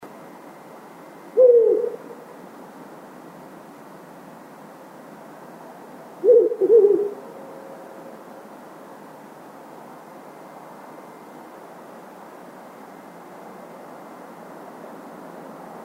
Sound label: owl